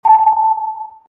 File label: Sonar sound